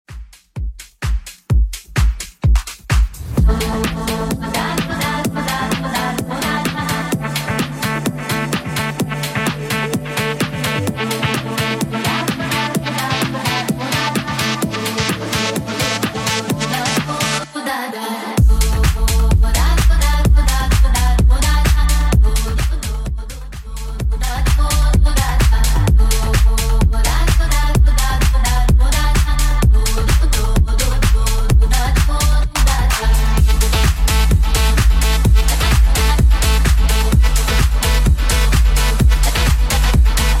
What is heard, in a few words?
Top40 Redrum 128bpm